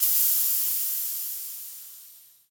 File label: gas; teardown; ps5 windows xbox series xs